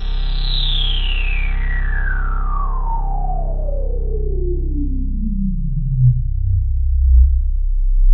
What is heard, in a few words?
FX SWEEP